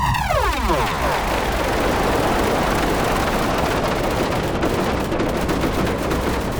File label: downlifter thing maybe